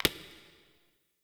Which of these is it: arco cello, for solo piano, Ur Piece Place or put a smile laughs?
Ur Piece Place